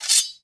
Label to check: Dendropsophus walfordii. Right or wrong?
Wrong